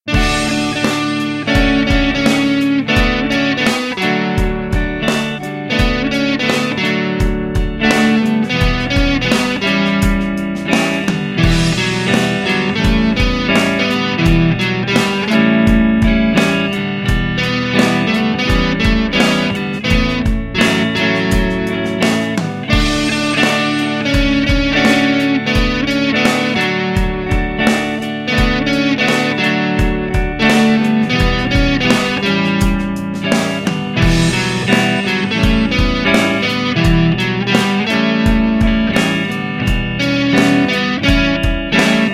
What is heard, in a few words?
jingle rock; xmas